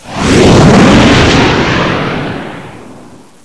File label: missile